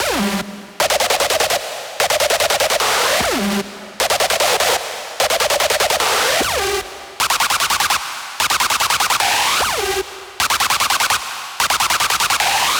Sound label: Full Mix G 150BPM, Rave Melody; Ghosthack; 7 Free Rave Melody Kits 2025; Kit Chaos